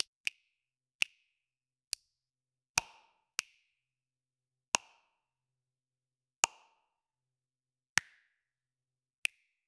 tiny plastic click soft, lego

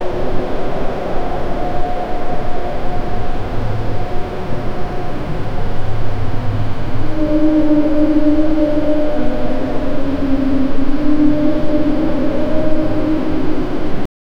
urban

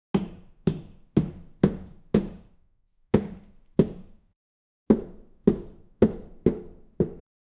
select ui option but make, heavy

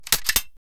sound effect of inserting magazine

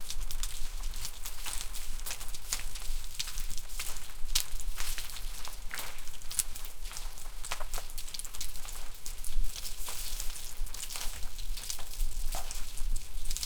WW2 soldier running, battlefield

